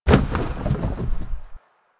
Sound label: Cardboard Boxes Destroy; Ambience; Props; Warframe; NineteenNinetyNine